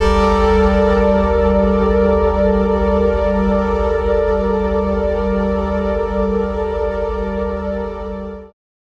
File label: Pad; neuro; InsideInfo